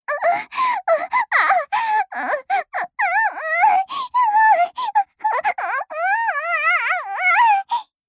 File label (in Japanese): 喘ぎ大; BGV